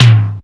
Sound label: Toms